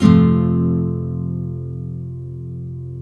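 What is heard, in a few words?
nylon F major up, chords nylon; Spectrasonics; Hans Zimmer Guitars VOL-1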